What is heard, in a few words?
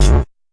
fx drum